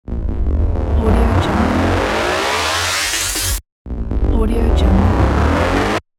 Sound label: riser